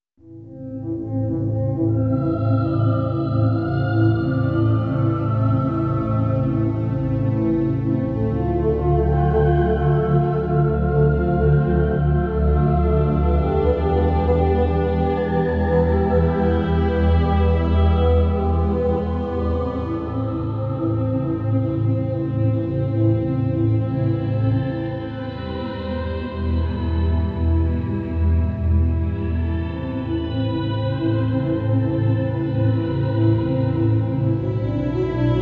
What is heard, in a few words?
low intensity; Chandragupta Warrior Prince; PS2